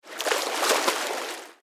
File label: Swimming Pool, Swimming Pool Human Kick Off Back Wall Splash; Indoor; SPORTS; SoundEffects-Blastwave